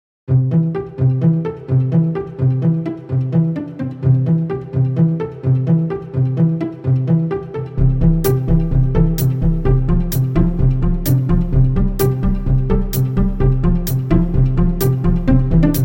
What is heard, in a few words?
Froggie Pond; Space Bob vs. the Replicons